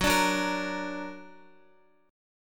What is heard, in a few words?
Gb Augmented Guitar; Gb+ strummed